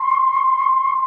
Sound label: whirly tube C4; SYNTH KEYS ETCETERA